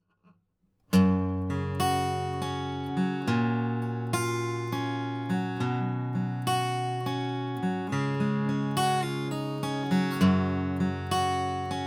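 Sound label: guitar pick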